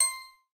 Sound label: counter; spinsane